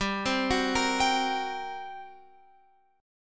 G Minor Major 7th Double Flat 5th G, Guitar Open F; arpeggiated